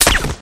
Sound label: weapon